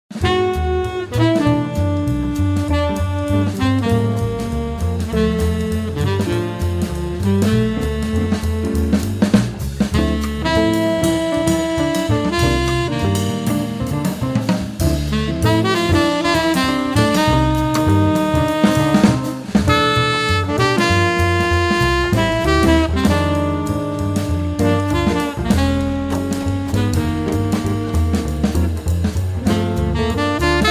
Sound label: Double Guitar Quintet